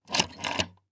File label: Household Phone Dial Rotary One